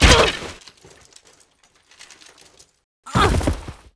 MON DIE

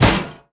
armor

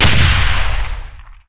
Weapons Gun Canon

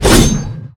Attack

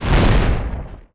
ATTACK TREE

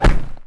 hit guard build

